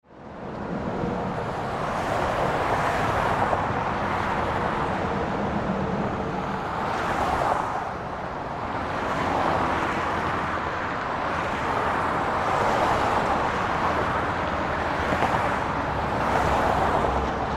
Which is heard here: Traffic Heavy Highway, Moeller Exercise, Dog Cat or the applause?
Traffic Heavy Highway